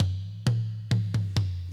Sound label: perc, drums; mercure drums